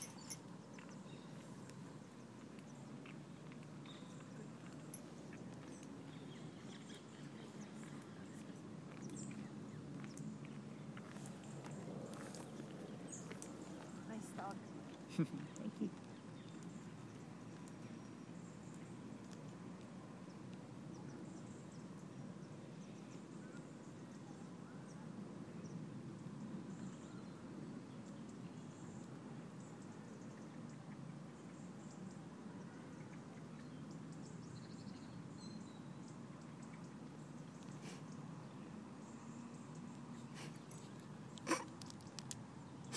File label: birds bangles and a retro phone by the thames